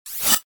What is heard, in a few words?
sha